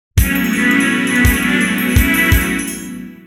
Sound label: Text Notification; Haste Fragment